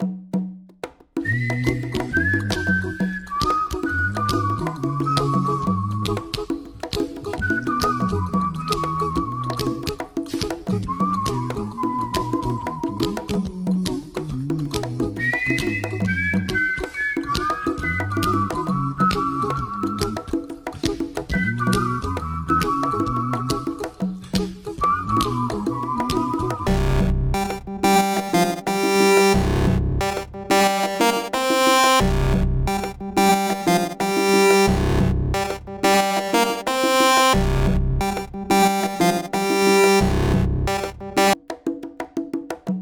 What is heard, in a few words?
be happy dubstep